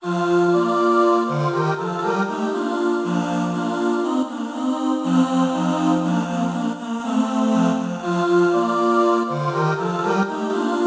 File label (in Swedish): Varsang kor